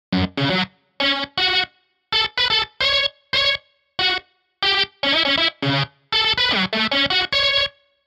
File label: Ostinato